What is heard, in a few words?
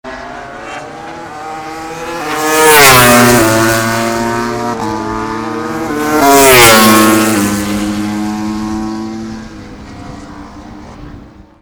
Racing Sound